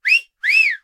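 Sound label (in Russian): короткий насмешливый свист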